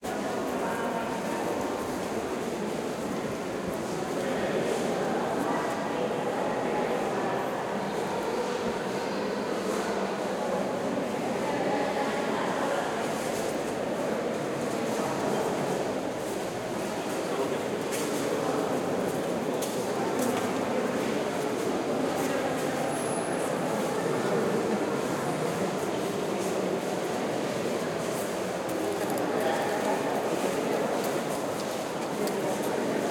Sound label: Neutral Interior Walla, Hushed Voices, Reverberant; CRWDWalla; Jake Fielding